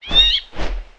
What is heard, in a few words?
Walk